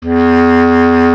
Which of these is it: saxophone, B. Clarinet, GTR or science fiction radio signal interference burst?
B. Clarinet